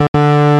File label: Incorrect